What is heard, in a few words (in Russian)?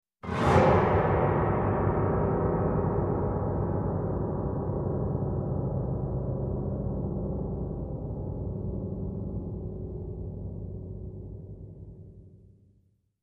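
страшное фортепиано глиссандо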